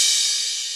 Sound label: Hats Real Open